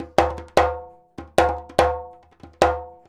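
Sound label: 100 JEMB, djembe; Univers Sons; Basicussions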